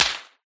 near, whizz; tacz-tweak-pack; taczmechanics

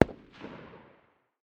ar far distance, 5.56x45mm; tacz-tweak-pack; taczmechanics

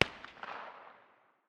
smg 9 far distance; tacz-tweak-pack; taczmechanics

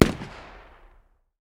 ak 762 medium distance; tacz-tweak-pack; taczmechanics

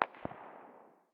ar very far distance, 5.56x45mm; tacz-tweak-pack; taczmechanics

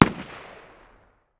ar 556 medium distance, 5.56x45mm; tacz-tweak-pack; taczmechanics

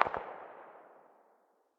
ak very far distance; tacz-tweak-pack; taczmechanics

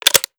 taurus cylinder close; daffas arsenal; tacz

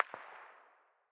smg very far distance; tacz-tweak-pack; taczmechanics

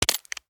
taurus bullet release; daffas arsenal; tacz

